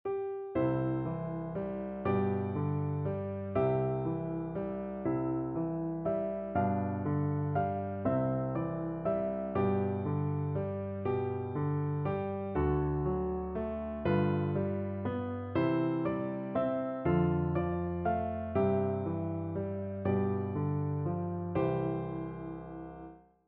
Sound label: martini plaisir, piano duet